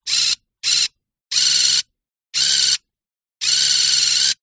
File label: science fiction robot motor server movements